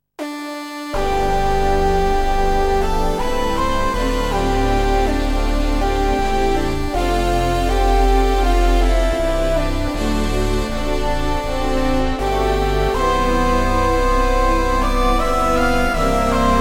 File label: Electro Overture; Katamari Damacy; PS2